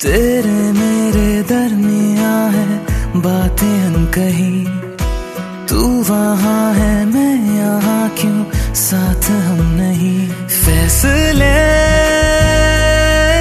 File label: Romantic Hindi Song Ringtone